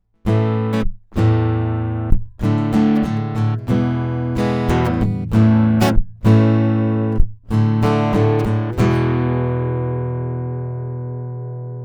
Camden Gitarre

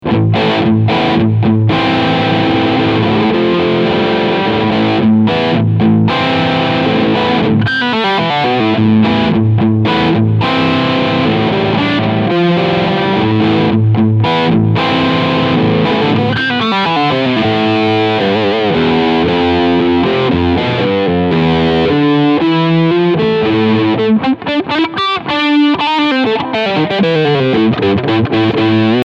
Steg Humbucker halbes Volume

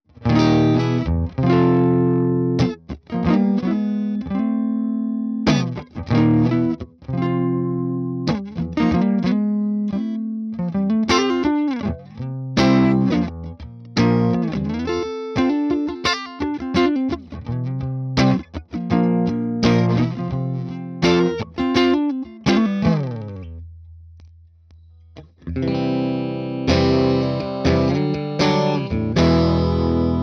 Morgan Amps SW50R Clean EQ 12 Uhr Bass Cut off Bright on

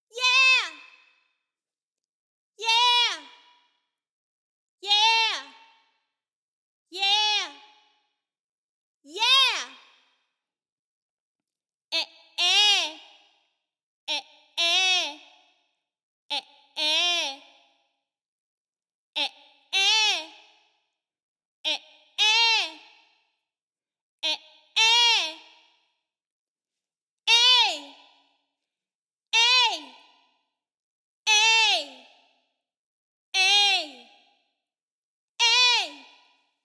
Rufe Yeah EY Ä Ä

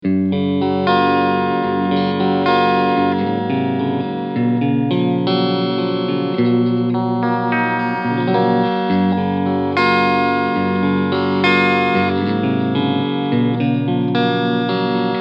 Kramer Guitars Jersey Star E Gitarre Clean Mitte Steg Pickup Singlecoil Mode

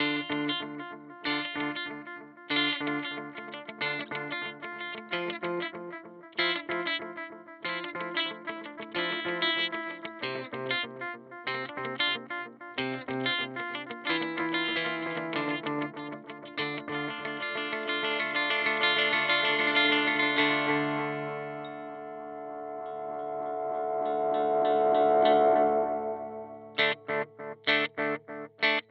Boss DM 2w Delayzeit Short

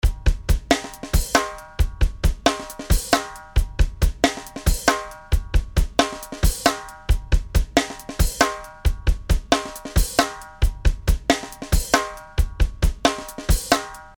Drums mono EQ nach Wunsch

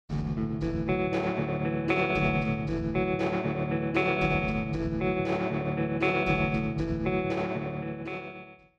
leichtes tremolo

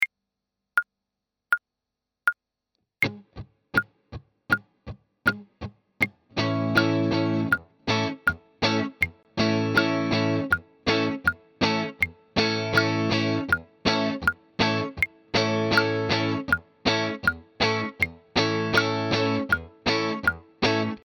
Beispiel 80 BPM

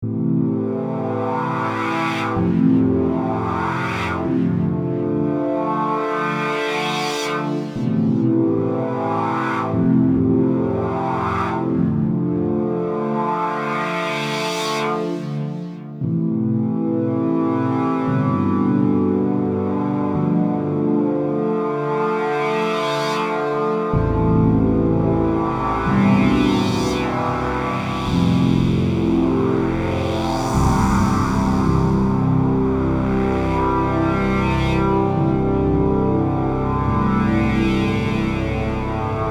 DM12 Flach

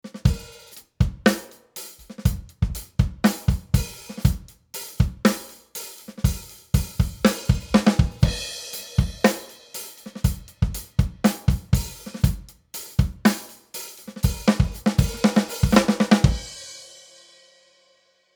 Summit Audio EQP 200B Drums mit EQP 200B

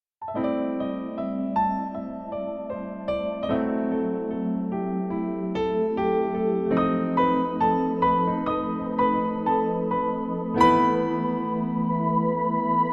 CFX und Pad und Sub

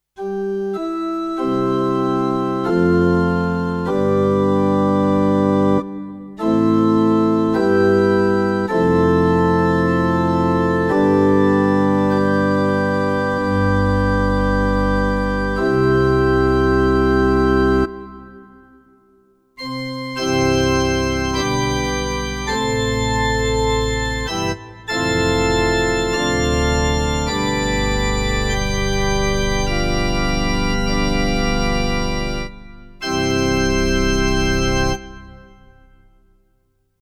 Yamaha CLP575 Pfeifenorgel

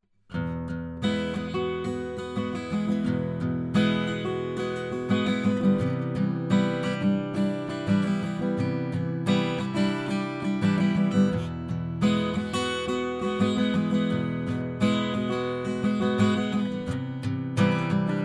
WA 47 Git Niere

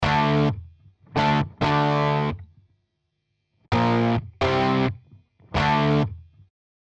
E-Gitarre Dist TUBE CULTURE DI-ing Guitars Settings

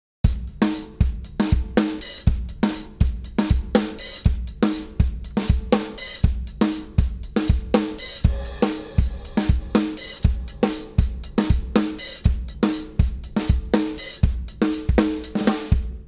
Drums Komp

